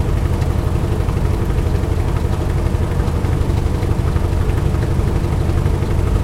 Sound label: wildcat cockpit, engines; War Thunder